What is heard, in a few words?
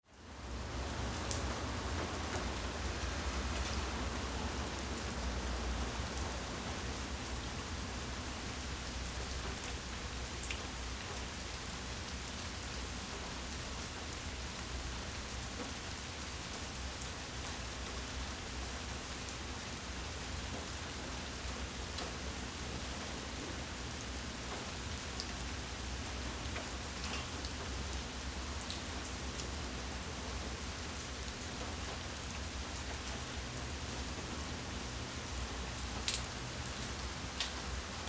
rain